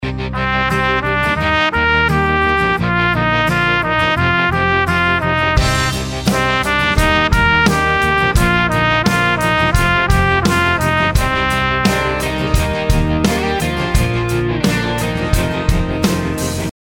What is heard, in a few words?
trumpet